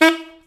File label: SAX A. STAB, Alto Section; Roland LCDP07 Super Sax; Alto Sect FX